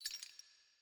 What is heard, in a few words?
ambience room chain base